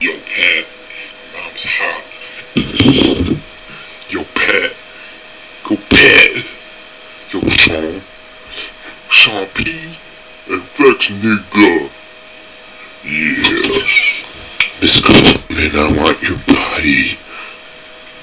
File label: ahahha